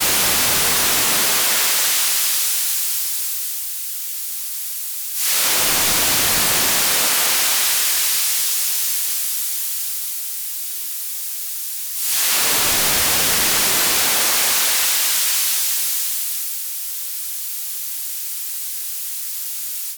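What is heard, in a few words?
noise high-pass filter stereo no resonance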